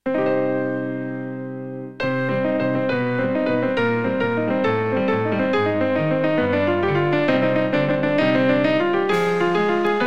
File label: Instrumental